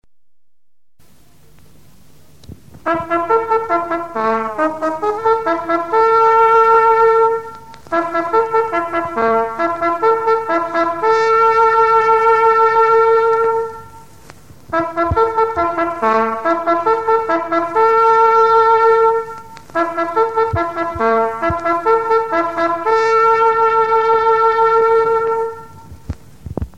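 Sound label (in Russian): Pionerskiy gorn - Gorn na obed, pioneer bugle - bugle call to lunch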